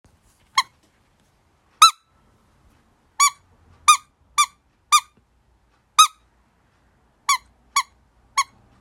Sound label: Shaker Pips, fox call